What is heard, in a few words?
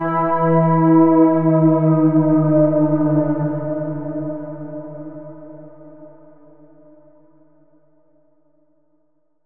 Riddim FX, E to D; One Shots; Ghosthack; Riddim Essentials 2020